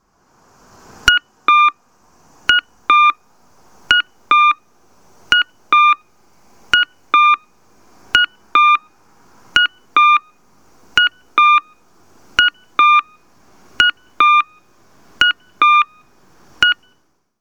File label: kakko, traffic signal; kyosan LTA-SBSK-B; onkyo kosaten; kyoto; yawata; yawatajiku